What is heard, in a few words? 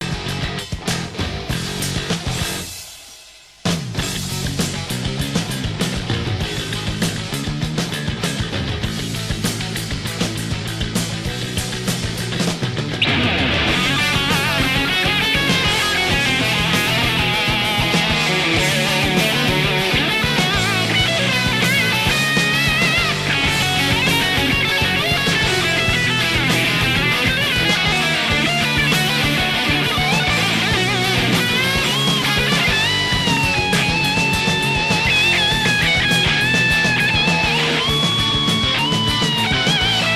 solo